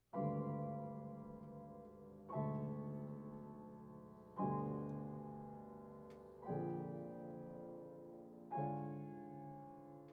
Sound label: mystery chords